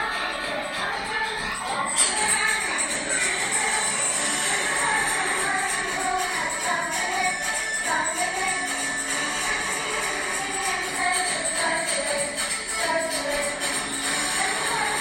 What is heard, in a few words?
Choir; Yr4 Yr5 and Y6 Choir